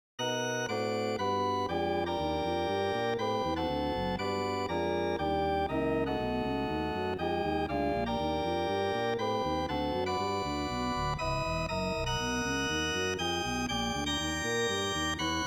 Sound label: 21edo; Xenharmonic